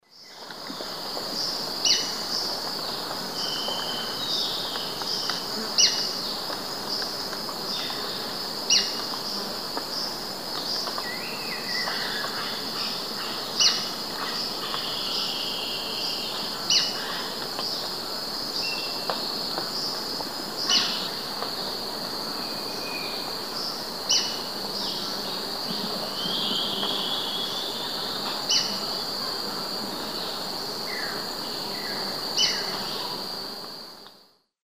Platyrinchus platyrhynchos, calls female; S. America; Eastern Lowlands

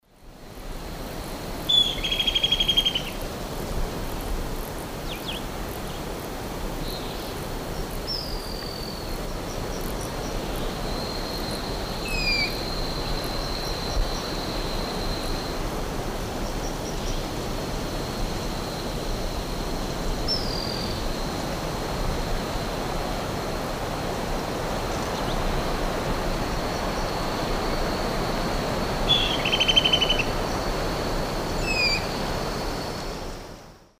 Gampsonyx swainsonii, calls; S. America; Eastern Lowlands

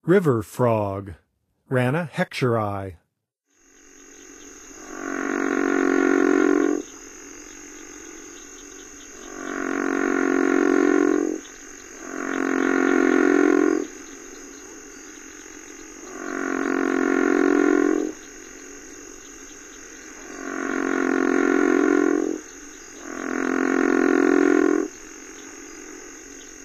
River Frog; North America; Songs of Frogs & Toads of North America

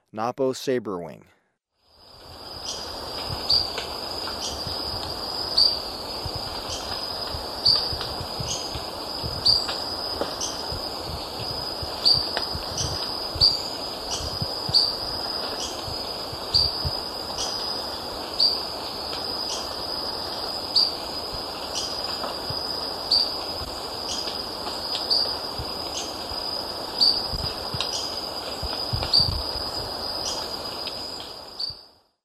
Napo Sabrewing, Birds of Ecuador; S. America

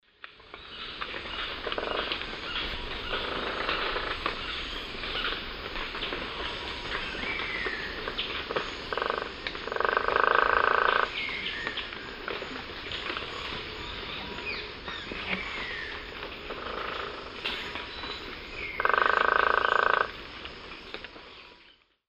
Picumnus lafresnayi, drum; S. America; Eastern Lowlands

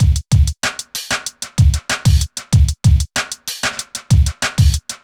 kit; Sound Effect Library

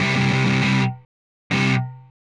Push Guitar Riff, Rock; Guitars

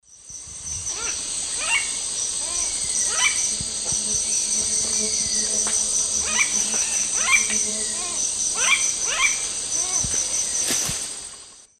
Deroptyus accipitrinus, calls; S. America; Eastern Lowlands

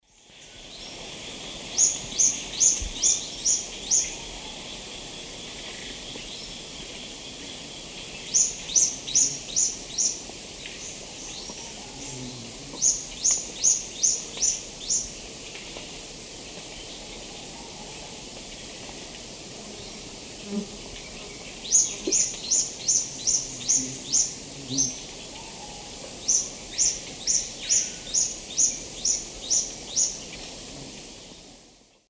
Epinecrophylla erythrura, song male; S. America; Eastern Lowlands

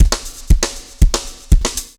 dance floor tight 120, loops

